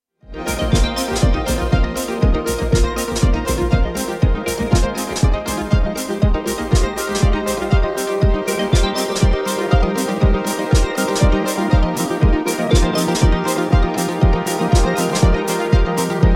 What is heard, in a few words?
percussions